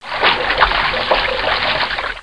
Splashing in Bathtub; Education Publishing Concepts

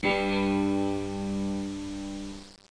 cittern; instruments various; Sound Effects for Multimedia - Volume 1

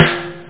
snare, houses; OctaMED SoundStudio V1; jazzies samples; perc

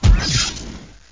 POW

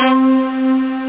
bottle stack hi

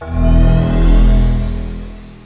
upgrade; acdemo; AC Demo 3-14-98 RipCord

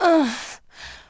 effort; urbanchaos

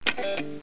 noiz; Music Toys; star

